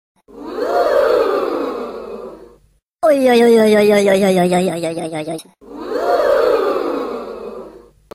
Uuiih Funny Sms

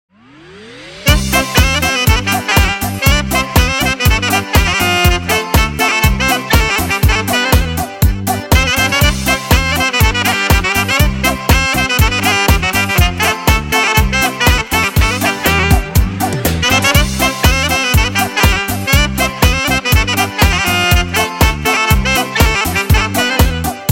Cool Sax

